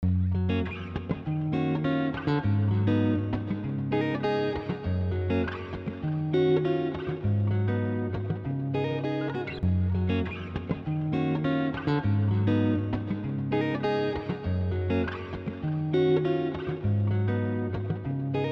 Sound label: Acoustic Instrumental